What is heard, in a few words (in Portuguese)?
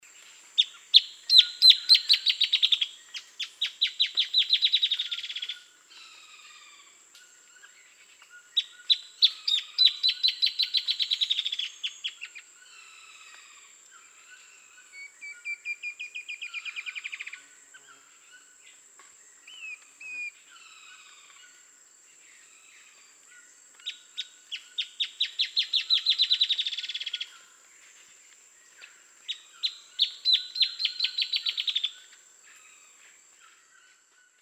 canto da choquinha listrada